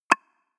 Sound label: pop